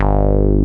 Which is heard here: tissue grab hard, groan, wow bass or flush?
wow bass